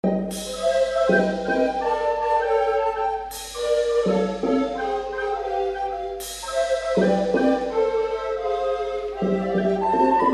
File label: Swinging Rag